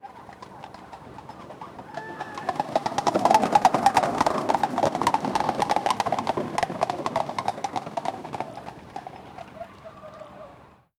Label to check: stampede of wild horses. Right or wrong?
Right